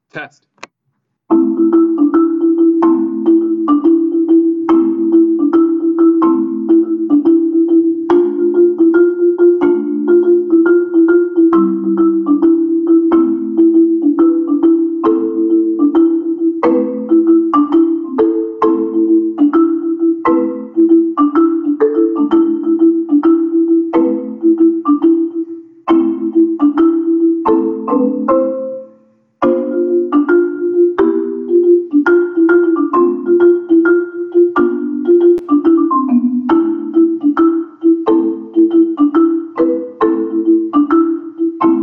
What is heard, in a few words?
marimba; improv: Marimba